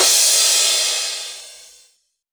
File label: Crash; 128 BPM; Out Of My Mind